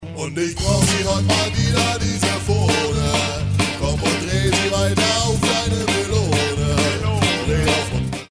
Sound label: rock